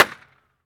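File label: percussion handgun, guns tuned; Roland - Rhythm Section; Guns & Glass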